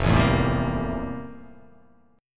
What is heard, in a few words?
F Major 11th, chord; strummed; JGuitar